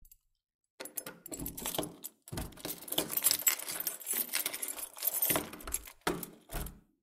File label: door lock sound